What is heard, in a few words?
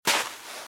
Footsteps - Sand - Dry - Walk - Right; RadwinIsland